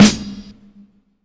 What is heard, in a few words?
Snare Hard, drums; One Shots